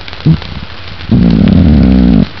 Epinephelus striatus Tonal

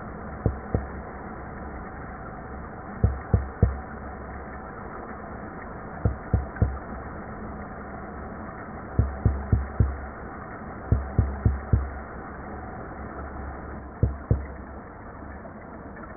Umbrina cirrosa Low Frequency Pulse, fish; Shi drum

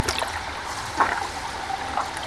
Oncorhynchus kisutch Air Movement

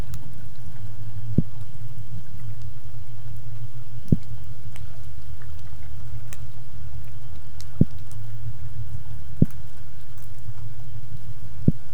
Sebastes caurinus Pulses; Copper rockfish

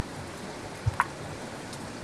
Oncorhynchus tshawytscha Pulse

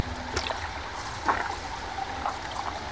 Oncorhynchus kisutch Surface Air Movement

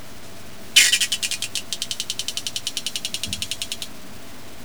Clupea pallasii FRT; Pacific herring

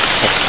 Unknown sp Sound

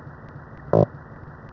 Argyrosomus regius Short Grunt

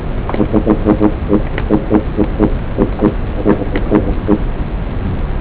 Pollachius pollachius Grunt; Pollack

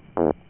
Argyrosomus regius Juvenile Distress Sound

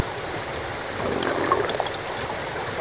Oncorhynchus kisutch gill bubble

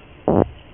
Argyrosomus regius Adult Distress Sound

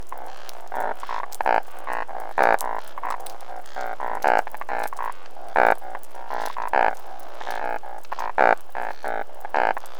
Chorus, fish; Sanjiao

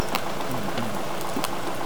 Sebastes caurinus Grunts; Copper rockfish

